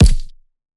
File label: Punching Perc, Straight Glove (Chain); 99Sounds; Punching Percussion